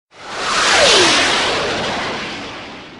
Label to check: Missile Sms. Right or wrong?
Right